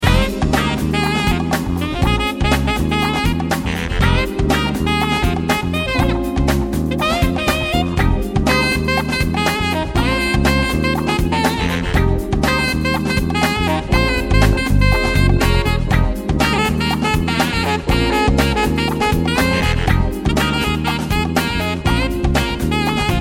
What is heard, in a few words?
Sax me up